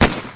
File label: Udar knutom; Как будто кто то ударил кнутом.